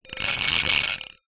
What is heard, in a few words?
foley metal small dish drag scrape concrete